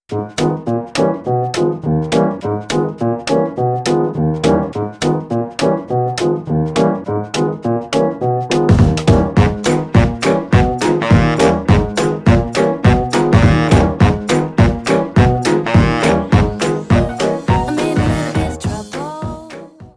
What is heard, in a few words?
Quick Step; 50-52 MPM